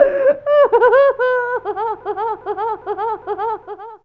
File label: Laf